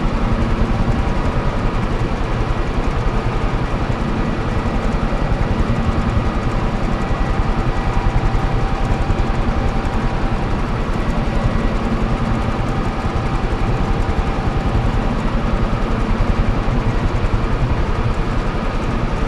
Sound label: ventilation fan; Titanfall 2